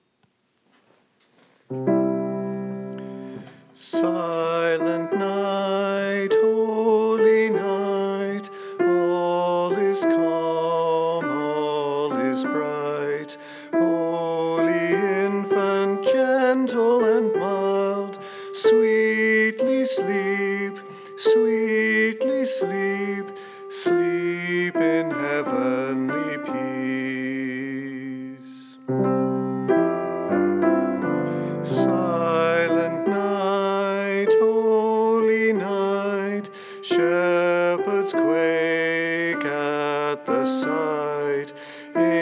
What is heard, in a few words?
Soprano